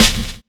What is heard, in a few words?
Snare One Shot C Key, acoustic snare sample tuned to the C note